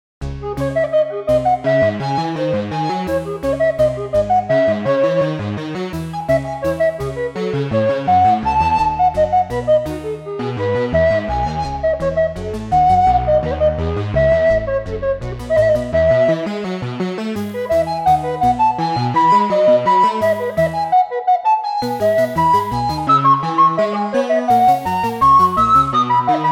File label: bach two part invention; baroque now